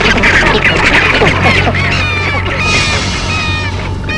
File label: Squack; Wiard; wiardgroup